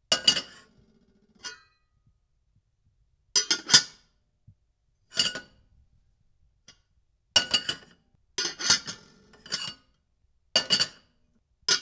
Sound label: Pots And Pan; Cooking